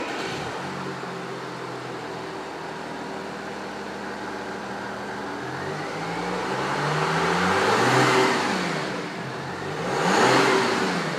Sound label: volume sound original